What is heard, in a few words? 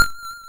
Vibra F3; multi-samples; Vibraphone; CHIPSHOP DELUXE